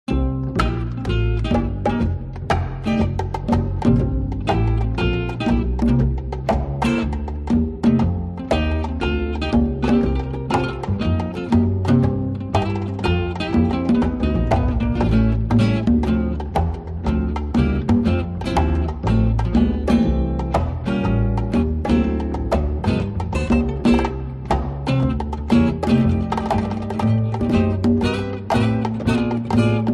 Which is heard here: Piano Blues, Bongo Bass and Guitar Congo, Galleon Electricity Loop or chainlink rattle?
Bongo Bass and Guitar Congo